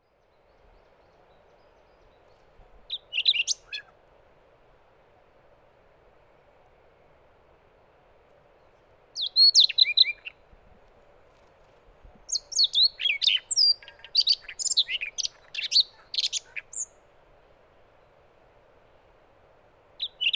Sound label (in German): Rubinkehlchen